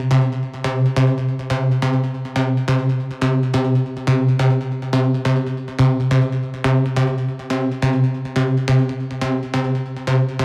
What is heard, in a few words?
Lead C 140BPM, Techno; Rave; Melody Kits; Stems; Ghosthack; 7 Free Rave Melody Kits 2025; Kit Reasons